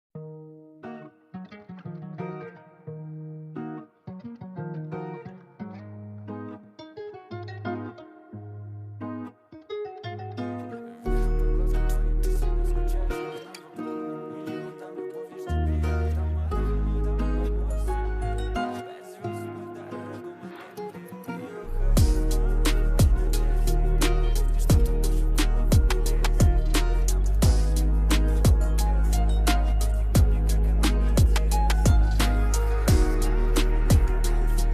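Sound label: backing track karaoke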